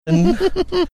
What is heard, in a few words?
cute giggle